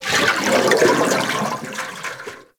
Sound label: Coolant Tank Step; Warframe; Corpus; Arachnoid Coolant; Steps